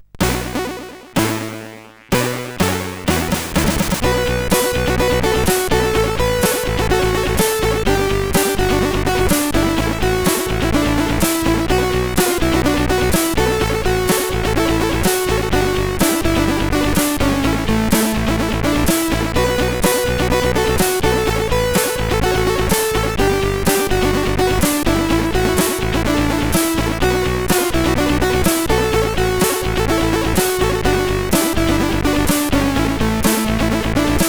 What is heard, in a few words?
Rain CSG8580R5; Moon